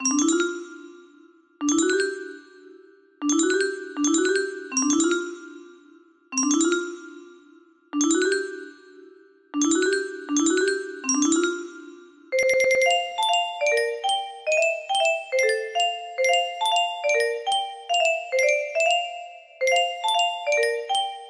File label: clavinet